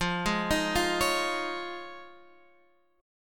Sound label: F Minor 7th Sharp 5th, Guitar, Fm7#5 chord; JGuitar